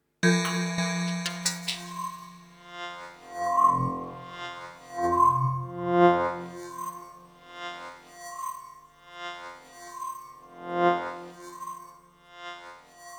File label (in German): ASR10 Wellensatz